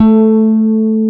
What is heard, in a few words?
E BASS A3